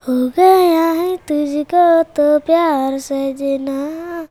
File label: CHILD, Indian Traditions